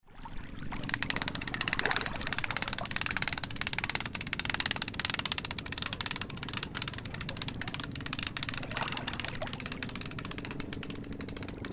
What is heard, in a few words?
Boat portable trailer crank winch